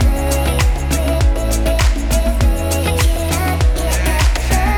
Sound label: Electronic